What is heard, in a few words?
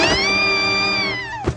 screaming; chaps